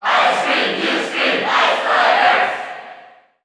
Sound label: Cheer English NTSC; Ice Climbers; SSBU